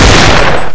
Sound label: Thompson shoot; dod